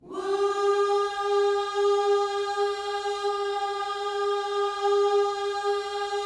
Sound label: WHOO G#4, Vocal Gospel; Spectrasonics; Vocal Planet Vol.1 Gospel; akai